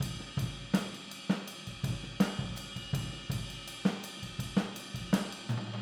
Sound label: Boogaloo Chorus Overhead ST, 82 BPM